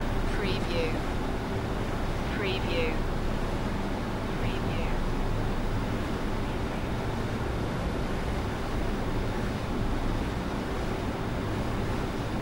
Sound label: Room Tone, Computer Room, Server Room, Machine Room, Multiple Computer Fans